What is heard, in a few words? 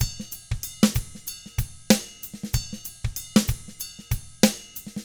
95 funk, drums; ILIO; Double Platinum Drums 1